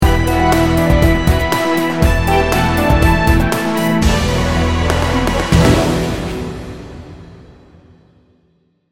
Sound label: global adventure sting bumper